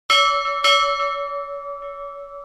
Bell Sms

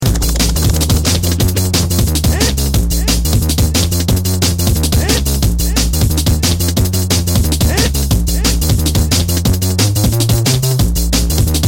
Drum N Bass

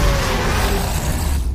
Roar; Hulk

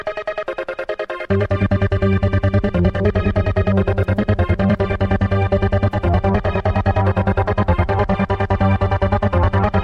Techno

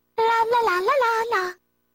Singing; Peach

